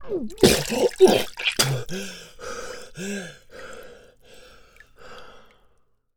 Air Rough, Humans; zps; HM Water

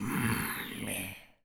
Zombies Male No Use; zps; Male1; No Action

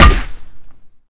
Aluminum Hit, Bat; zps; Weapons; Melee

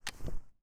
Remington 870 Ready Reload; zps; Weapons; Firearms

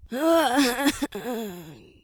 Death Rattle; zps

